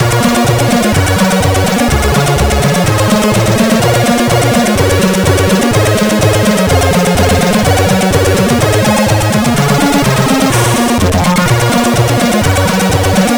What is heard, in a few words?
No Scroller Mix end, CSG8580R5